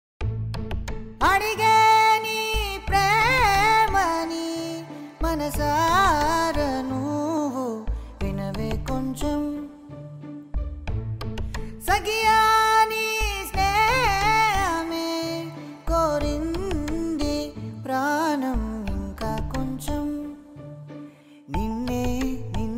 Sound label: Song Telugu